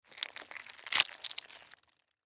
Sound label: Surgery Squishes, Surgery Squishes Guts; Emergency